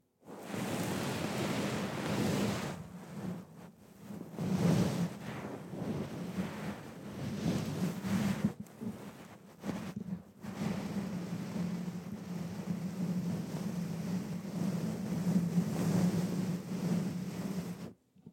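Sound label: microphone rolled on carpeted floor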